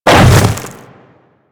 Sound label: Hammer Boss Step Ice, Footsteps